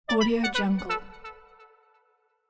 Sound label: simple notification